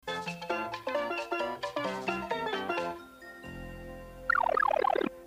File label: Ground; SMWTV